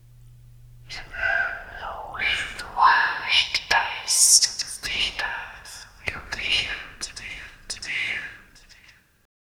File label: 4 whispers soft unknown